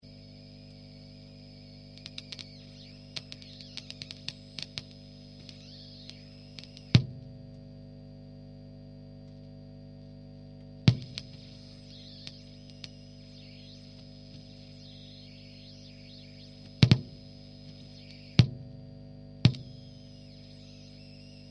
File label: hiss